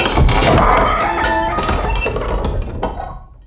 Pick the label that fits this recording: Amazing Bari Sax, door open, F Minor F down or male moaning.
door open